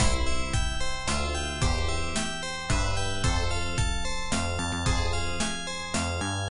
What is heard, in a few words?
theme loop slow bells romance